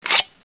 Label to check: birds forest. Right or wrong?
Wrong